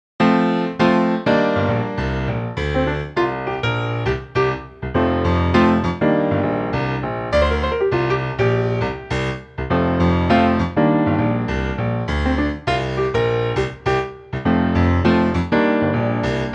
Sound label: Bb piano; key - Bb - vocal range - Eb to G